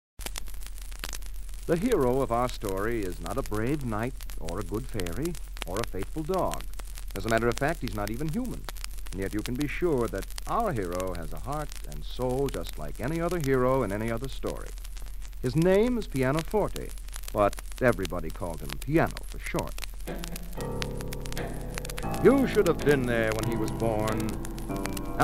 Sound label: eager piano